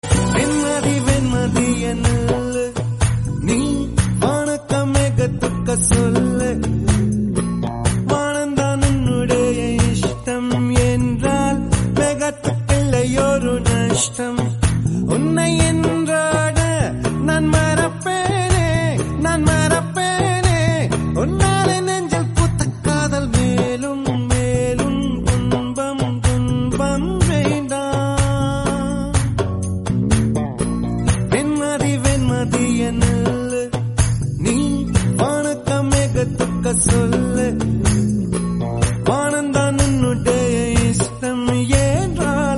tamil movie song ring tone

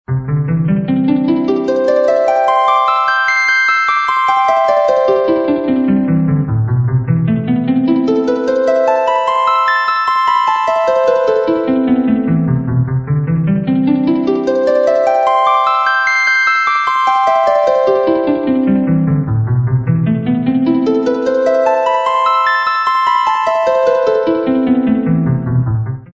ringtone; final fantasy

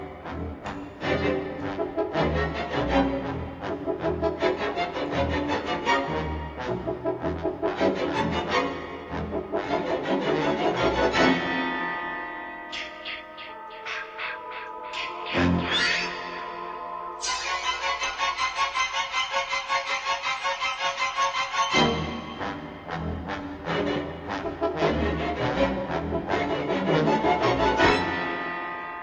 horror movie

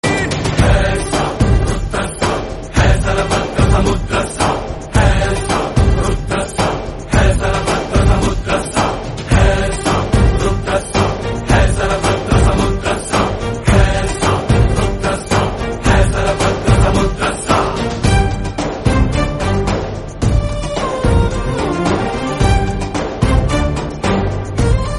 malayalam

